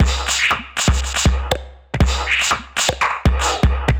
Two Rooms Kit, Vocal Percussion; Instruments; Ableton; Factory Packs; Voice Box

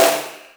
Breaker dirt snare; Ableton Foundation FreePacks; Wicked Kits

